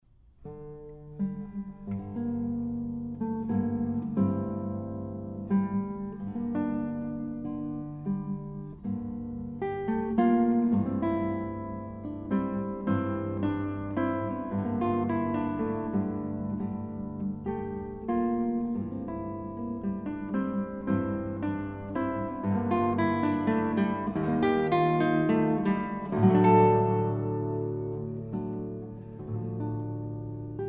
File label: Guitar Sonata in D major Andantino quasi conzone fragment; Mario Castelnuovo-Tedesco